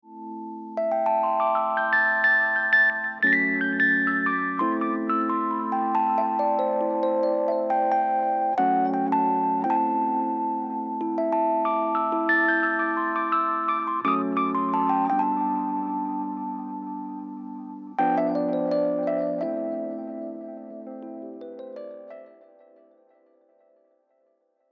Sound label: Rhodes ECHO; PSP